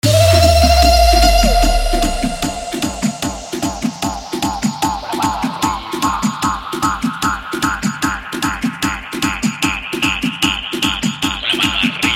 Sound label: Tribal Mix